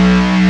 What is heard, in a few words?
SCRATCH, Prophet Five; E-MU Formula 4000 Series Vol. 3; Analog Odyssey